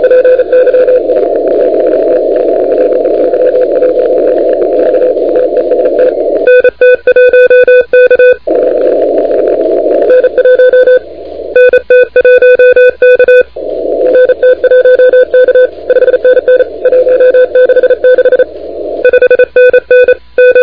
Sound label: CW 28MHz; ARRL 10M